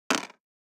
DICE on Plastic, Throw and Roll, Metal, 2 Two Dice; Samples; ArticulatedSounds; DICE Sfx Party (Extended)